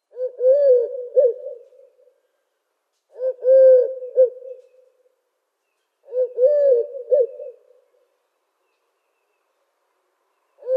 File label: Eurasian Collared-Dove calls